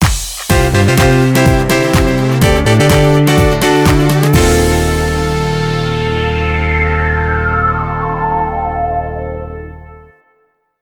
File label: Jingle; Gran Turismo 4